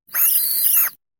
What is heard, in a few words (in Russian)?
Pauk pischit